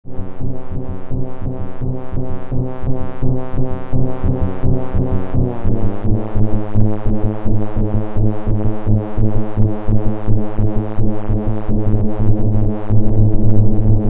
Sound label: hss2d